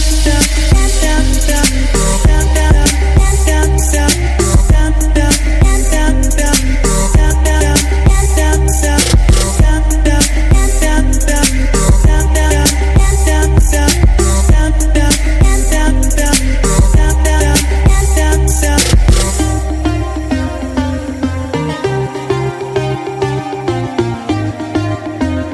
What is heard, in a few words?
english ringtone; english ringtones